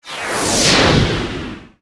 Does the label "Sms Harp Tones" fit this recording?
No